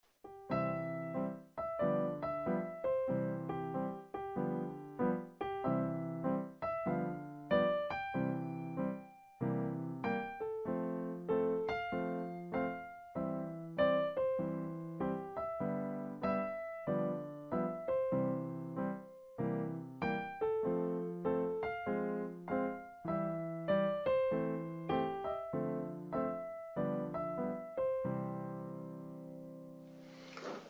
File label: jazz